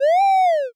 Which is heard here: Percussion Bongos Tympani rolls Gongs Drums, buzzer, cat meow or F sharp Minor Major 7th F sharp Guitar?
cat meow